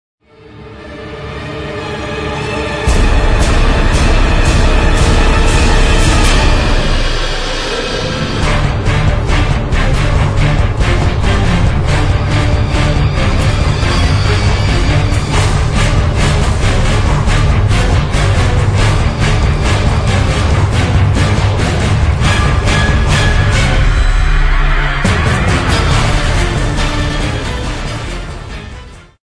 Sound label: Swords Crossed; Pirates; Curse of the Black Pearl